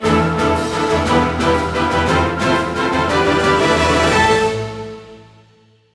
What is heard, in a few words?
Sting Boss Defeated; captain america super soldier; nds